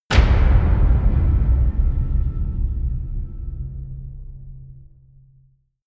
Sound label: impact hit; whooshes rises